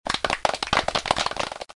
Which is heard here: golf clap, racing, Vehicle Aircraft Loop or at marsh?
golf clap